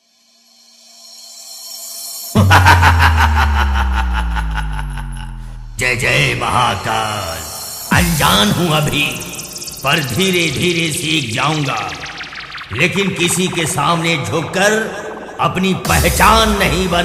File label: Dialogue Beet; Sawan Special; BolBam